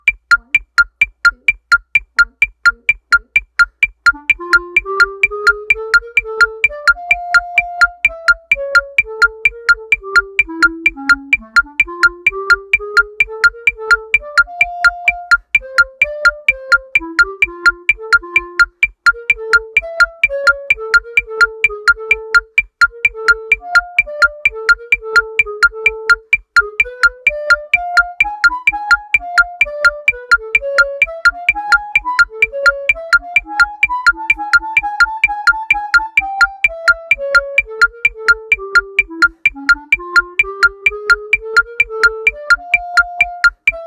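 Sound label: clarinet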